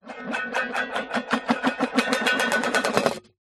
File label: metal money tin lid spin on table; zapsplat